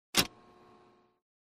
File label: Machine Handle Close